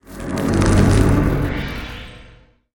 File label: death body magic; smite; osiris